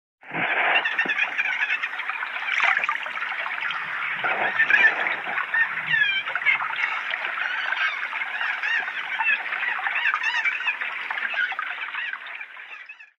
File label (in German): Grosser Tuemmler, Delfinstimmen; delfinstimmen und walgesaenge; Walstimmen